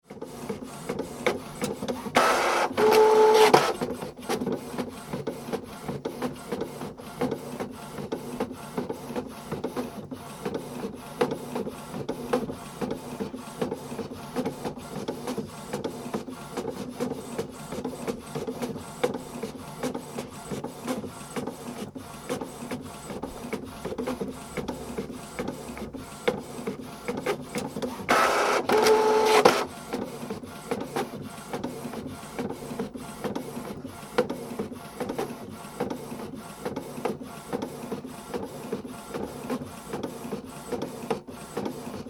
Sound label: Printer Bubble jet Small Portable; Various printing sounds; Printers; Electronics; Tom Hutchings